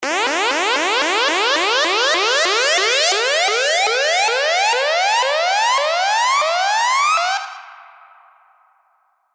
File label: Uplifter